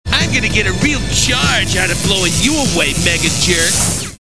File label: real charge